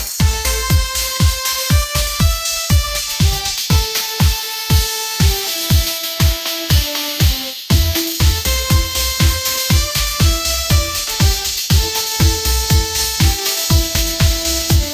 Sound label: upbeat pop melody catchy hook